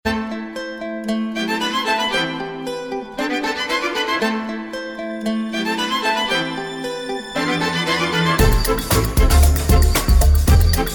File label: Violin Triphop; jazz klassik; ghia productions